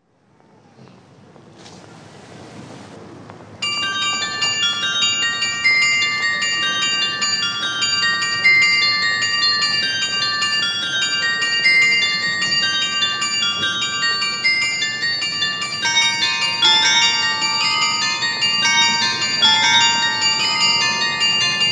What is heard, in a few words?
Tubular, carillon